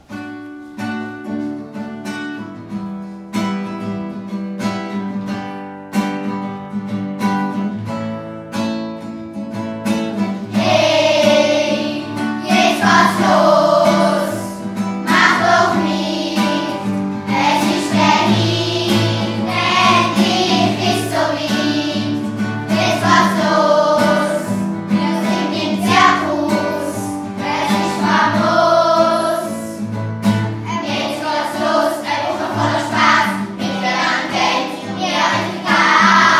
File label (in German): Zirkuslied Gesang 2. Klasse